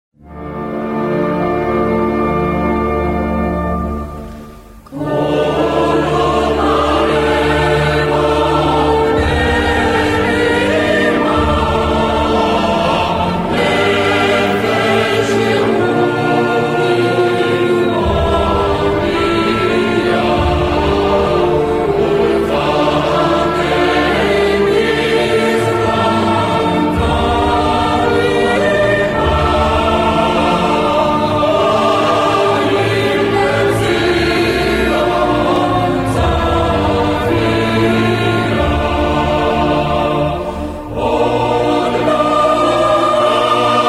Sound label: National Anthem; Isreal